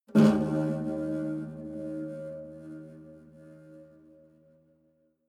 low string buzz damped

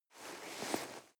nylon leather soft

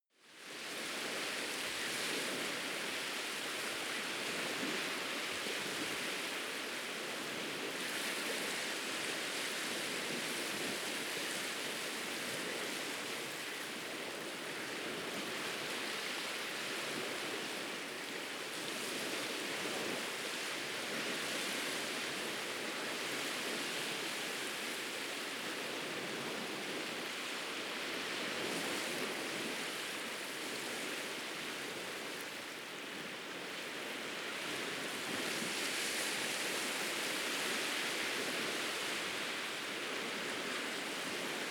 beach waves soft

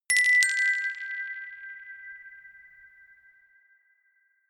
notification glockenspiel rattle third down

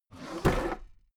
desk drawer open heavy

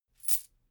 chain mail armor movement

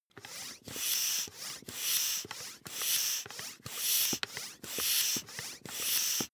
air pump ball sequence fast

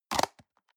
plastic box food small close hard

